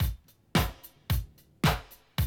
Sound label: Classic DrumLoops, 110